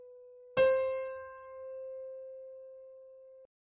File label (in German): Ton, Klavier einzelne Toene